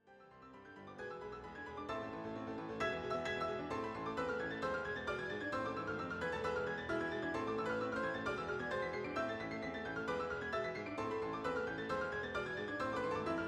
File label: 4 Hand Piano